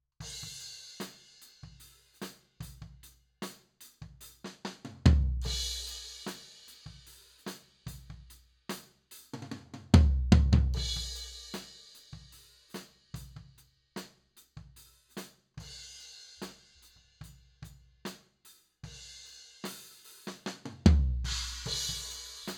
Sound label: Floor